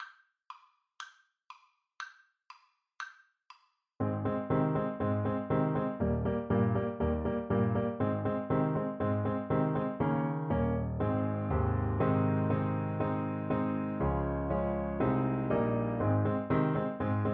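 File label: camptown races, alto saxophone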